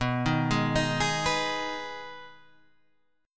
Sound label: B 6th B down, chord